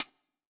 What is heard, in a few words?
Hi Hat, One Shot Drums; Sonic Foundry (Sony Creative Software); Knutrix Drum & Bass